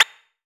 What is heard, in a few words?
PUPPY; akai; mpc1000; factorypresetsamples